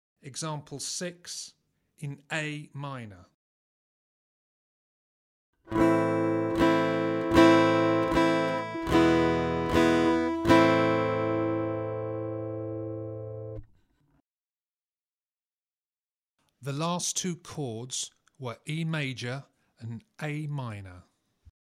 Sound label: Aural Harmony Test, acoustic guitar; Grade 5